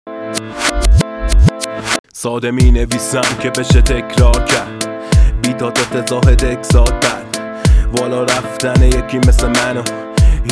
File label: West Coast Gangsta Rap Vocal